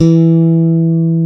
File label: BASSES, Roland